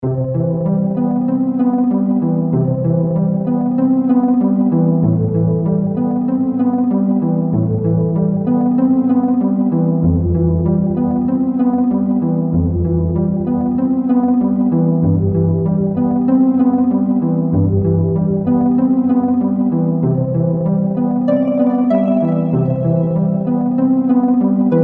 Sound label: Pluck; Luminis